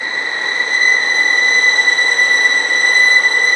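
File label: strings, Vlns; Roland L-CD702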